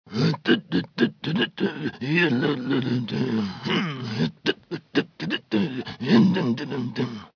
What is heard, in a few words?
humming; watto